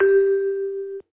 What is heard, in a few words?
key